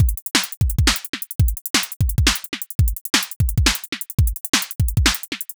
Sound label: fast drum and bass break 172bpm 4 bars